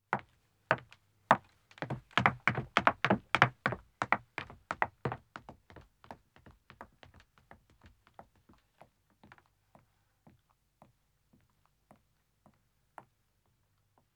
Wooden Stairs Descending, 1 Wo, Footsteps; BBC Sound Effects Library; Footsteps II

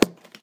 bottles popping; Sound Design Tools; Raw Foley

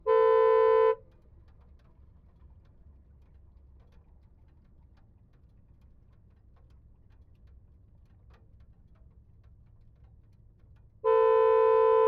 Porsche 997 GT3, Horn, Various, Engine, Left; RS 2008; PZM

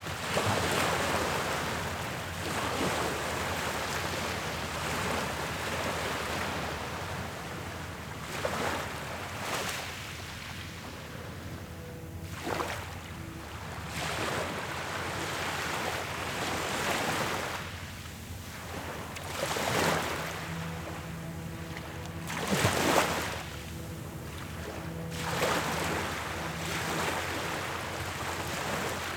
waves port edge malta; 3maze

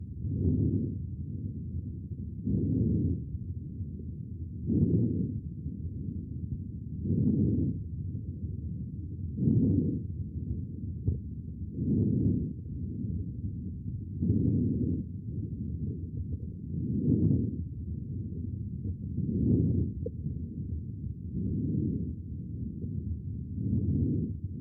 Stethoscope Chest Lungs Breathing Slow Low; ShapingWaves

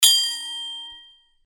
Sword Sound; FXHome; Fighting Sounds

